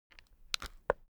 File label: object case spectacles close soft; smartsoundfx